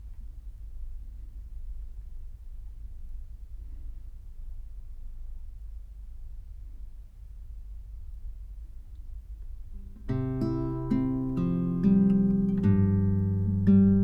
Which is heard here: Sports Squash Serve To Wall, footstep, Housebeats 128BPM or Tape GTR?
Tape GTR